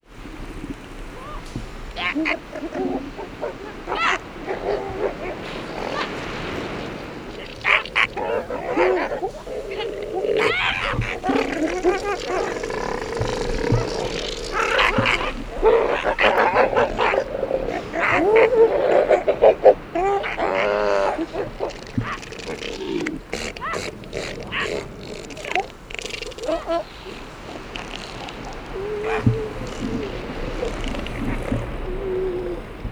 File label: Elephant Seals Protest